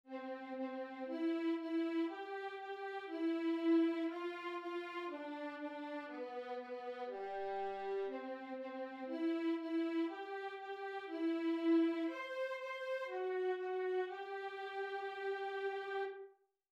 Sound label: Melodic Dictation; Level 8